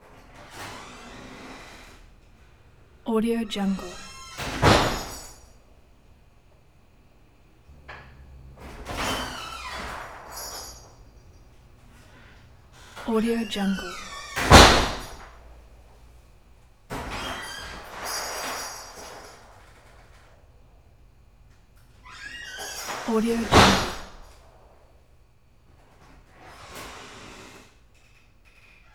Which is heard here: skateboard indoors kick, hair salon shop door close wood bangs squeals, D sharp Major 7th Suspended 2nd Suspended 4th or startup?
hair salon shop door close wood bangs squeals